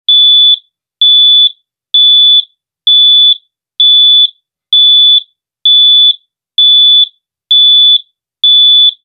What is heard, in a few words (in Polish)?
ton